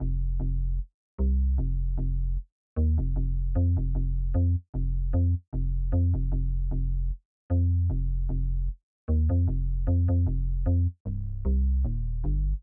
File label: 808 bass line, 76 bpm